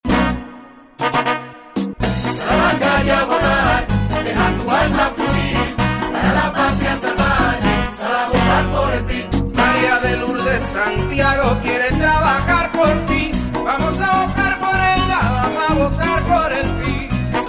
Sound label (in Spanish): Jingle, 30 segundos; Maria de Lourdes Gobernadora